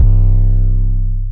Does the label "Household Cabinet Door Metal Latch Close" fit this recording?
No